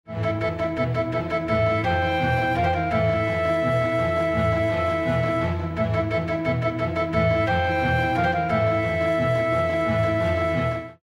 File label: bomb ten sec count; music kits custom